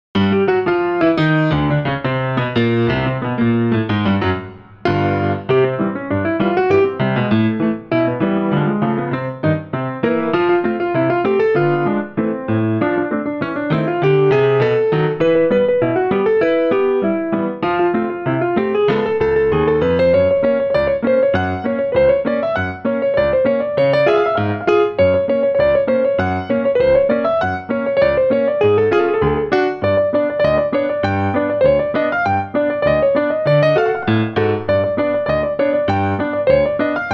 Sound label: piano